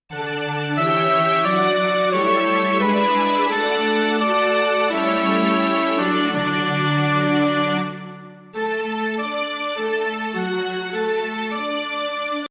strings; ANTHEMS

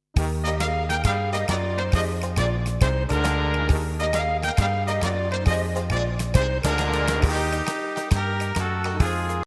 jazz

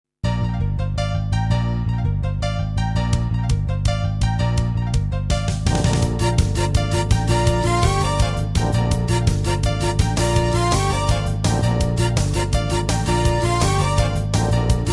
jump Bb

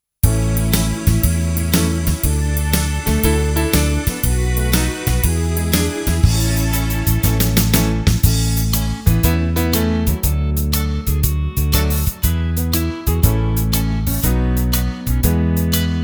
click go shears K=A; AUSTRALIAN

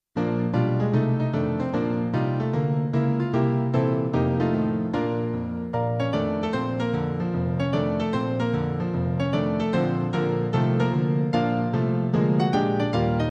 i love piano; broadway

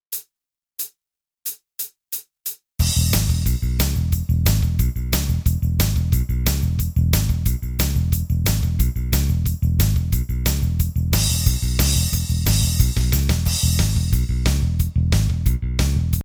bible belt, BASS & DRUMS

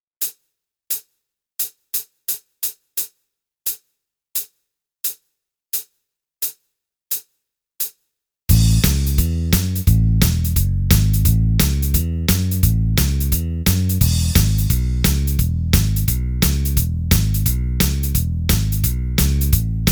guitar

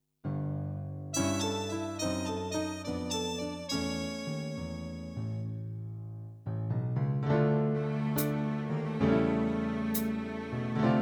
le jazz; broadway

